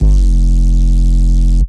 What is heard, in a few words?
808 F; Cymatics